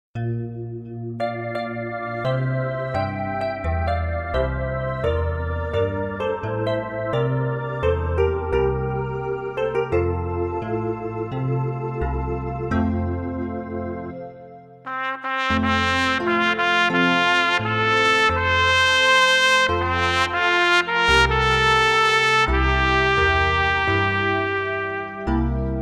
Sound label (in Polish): santa maria 1 trabka